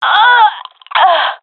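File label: death, female; Counter Strike Model Pack; CS:GO; gendermerie fem epic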